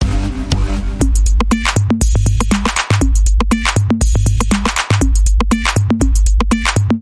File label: Beat Sync Dance Bumper